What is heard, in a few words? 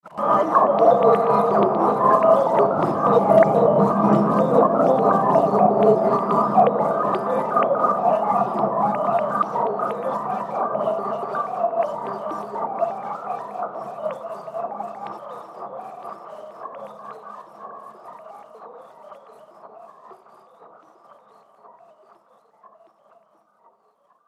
Alchemy Fantasy Ambience